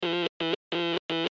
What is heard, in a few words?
Morse Code C Letter; Communication Amateur Ham Radio